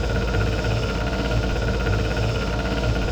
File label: conveyor; Matt Hoffman's Pro BMX 2; XBOX